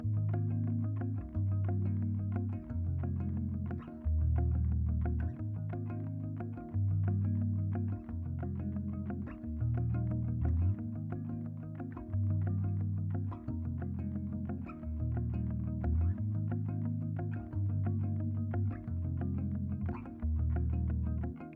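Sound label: compound, electric bass; Compound 3; These two compounds work with a third part which is an ascending arpeggiated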